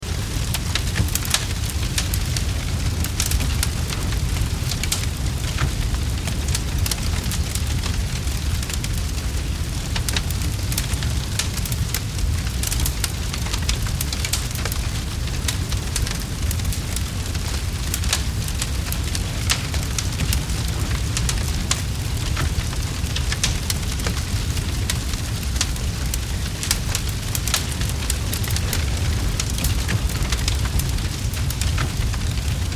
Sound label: Fire House Int, Fire House Interior Structure Wood Flames Large Loop; Flame Sound Effects